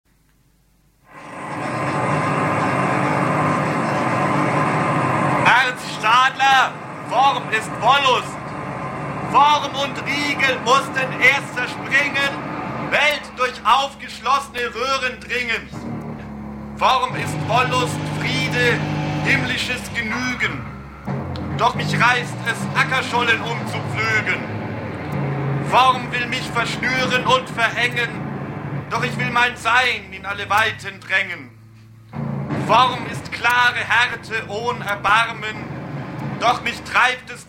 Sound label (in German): sprache orch jazz